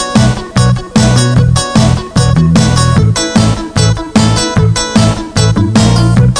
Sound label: 80 pops